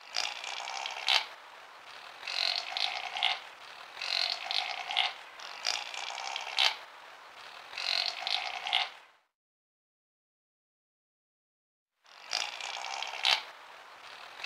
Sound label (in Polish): Kozica odglos